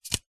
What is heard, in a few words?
aerosol deodorant can catch